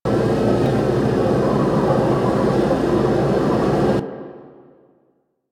Pipe Machine Loop A; Warframe; Ambience; Grineer; Grn Hanger

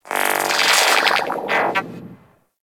Gas City TV noise; Ambience; Props; Warframe; Corpus Gas City; Props Remaster

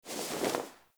Textile Pickup; Objects; Pickups

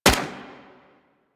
Sundial SMG Shot, weapons; Warframe; Tenno

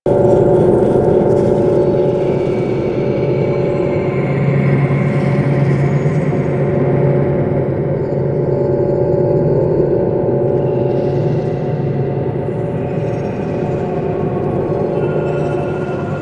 Hub Codex Room Amb, Ambience, Interior; Warframe; Tenno

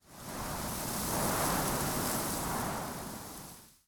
Forest Open Glade Wind Gust Dry Grass; Ambience; Day

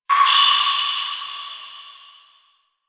Distant Drip; Warframe; Ambience; Entrati

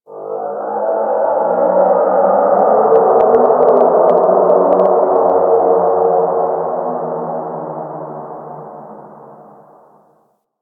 Landscape Ambient Texture; Warframe; Ambience; Venus Open World; Textures

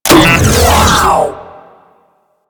Console Un Purified, Ambience, Infested; Warframe; Golem Trial

